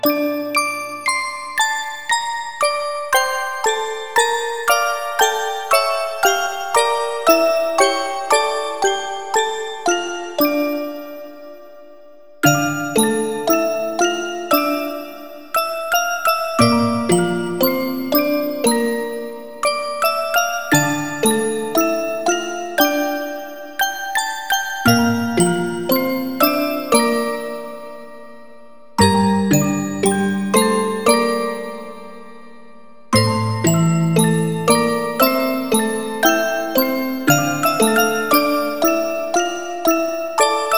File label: pathetic2 (orgel arrange); mahou shoujo ai 2 soundtrack cd